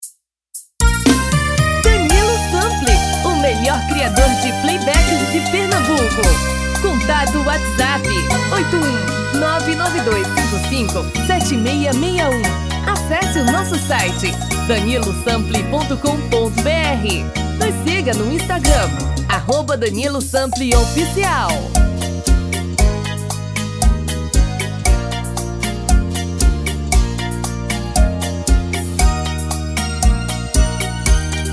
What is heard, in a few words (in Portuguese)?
dois tom e meio acima